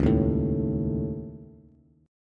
Db Suspended 2nd Sharp 5th Db Bass; strummed; Dbsus2#5; JGuitar

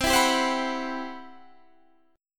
C Major Sharp 11th, Guitar Standard; CM#11 strummed; JGuitar

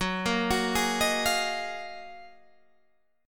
Gb Major 13th

